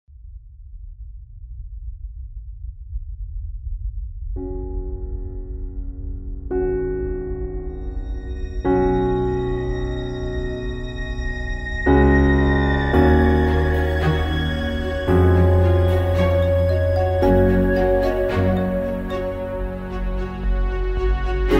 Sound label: Music Chillout